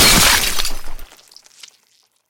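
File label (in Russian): звонкий короткий звук разбитой бутылки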